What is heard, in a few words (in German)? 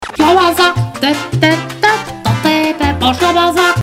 Schlabbersack song